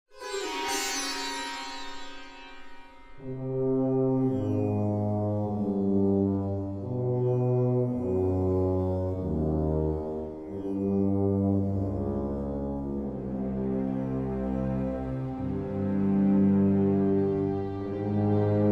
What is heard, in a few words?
Music; Age of Empires III; cinematics